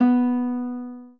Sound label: spanish guitar